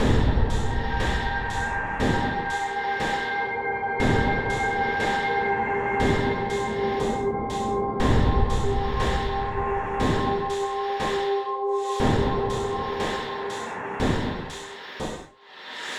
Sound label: Success Tonal B4; Insanely Twisted Shadow Planet